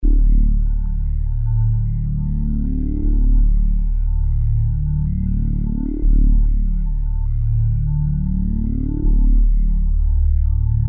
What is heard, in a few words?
Rez Drone Looping